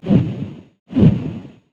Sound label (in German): rauch